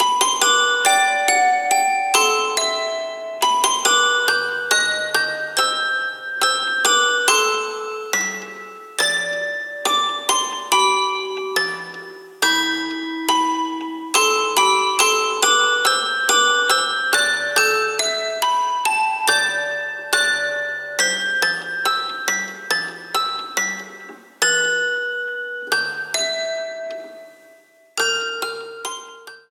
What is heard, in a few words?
A Bittersweet Music Box